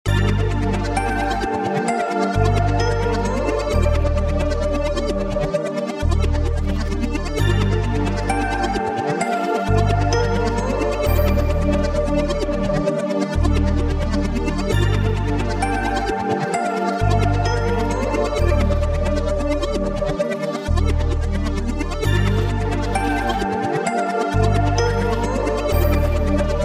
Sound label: 131bpm G min